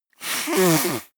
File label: nose blow